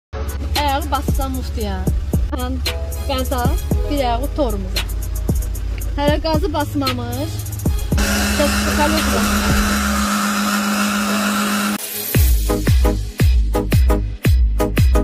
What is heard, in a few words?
azeri music azeri bass meyxana; vaz 2107; megabeatsz